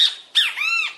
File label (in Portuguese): bem te vi cantando